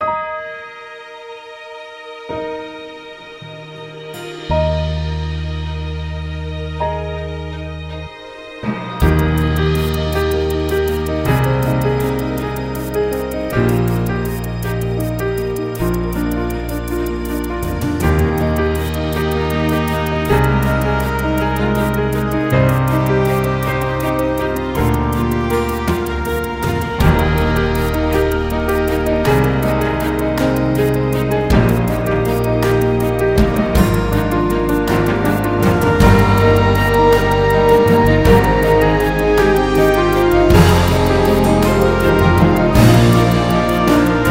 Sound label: Inspirational Orchestral Themes; Production Music